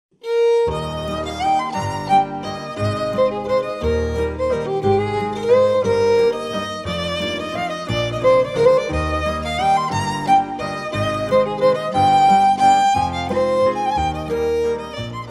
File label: waltz; walzer; DONEGAL WALTZ